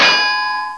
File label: Metal hit; Demo Man